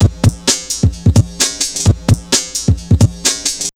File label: JMP 130 LP, Big Beat Drumloops; JUMP 130